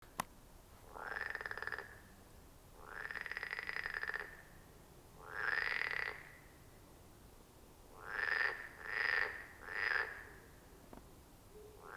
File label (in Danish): groen froe